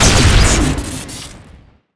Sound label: fire crit, weapons; spyhunter; tf2classic